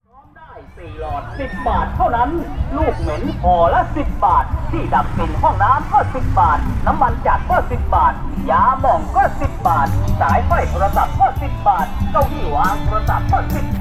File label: Sunday market in Thailand